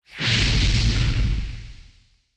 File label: Big Light Up Sound